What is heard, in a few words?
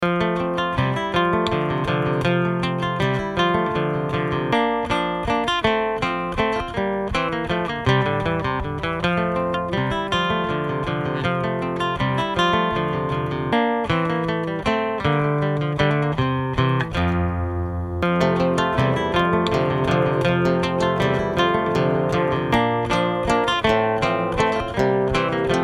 acoustic Menuett; Bach